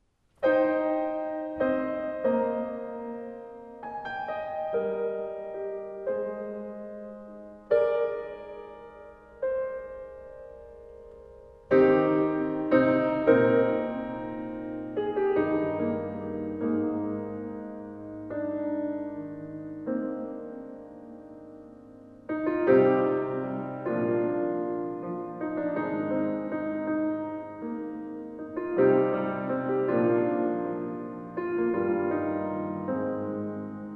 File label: Piano Improvisation